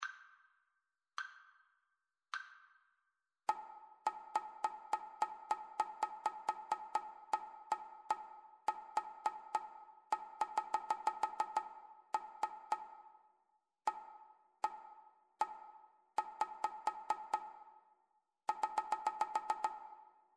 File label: rhythmic dictation; ear training